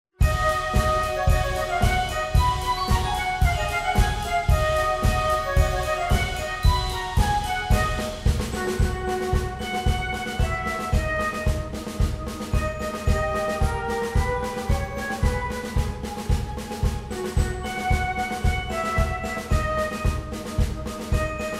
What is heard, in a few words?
Polka Polka Polka